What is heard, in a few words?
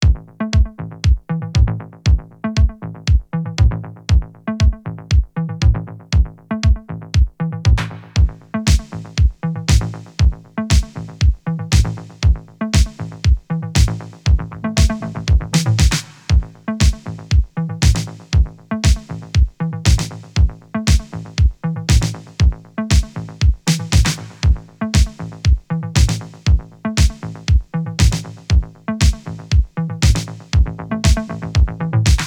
Discodub